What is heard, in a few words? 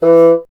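wind bassoon E; Miroslav Vitous - Solo Instruments VOL-1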